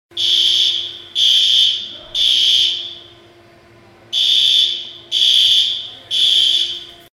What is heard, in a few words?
Fire alarm